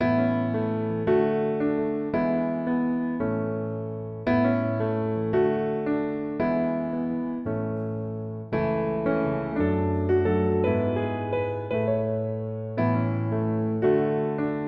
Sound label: Inspirational Piano Loop